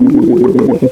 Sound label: kingpin alert; svencoop